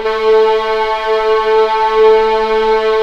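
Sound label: A3 LEGATO P, VIOLINS; East West; The Ultimate String Collection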